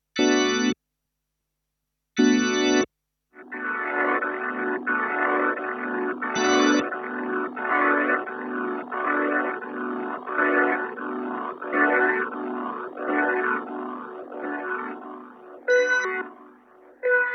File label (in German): Strymon Time Line Lo Fi Orgel